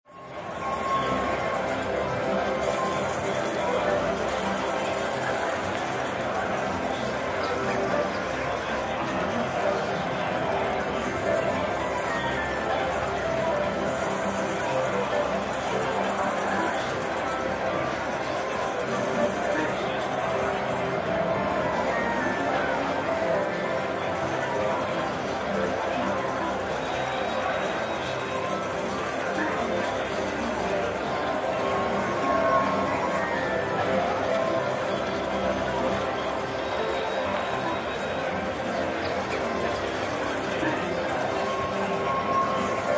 Casino hall, people chatting, multiple slot machines; Casino ambience; and gambling sounds; People Sound Effects; LynneMusic